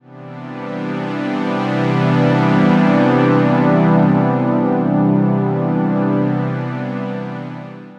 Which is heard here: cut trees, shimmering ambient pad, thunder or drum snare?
shimmering ambient pad